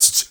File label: MALE SOLO, MALE NOISE, Heart of Africa